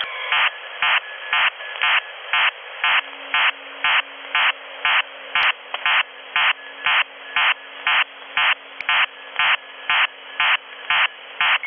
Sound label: MAHRS-EADS serial modem 2k4 Bd PSK 8 on 3507 kHz; Arcotel